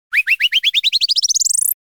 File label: Whistle Comedy Run - Accelerating; Hollywood Edge; sound designer's toolkit v2